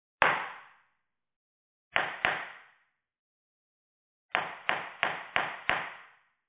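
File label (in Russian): три варианта на среднем плане; Звук молотка судьи в зале суда; Есть звуки суда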